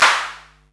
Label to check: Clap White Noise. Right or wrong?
Right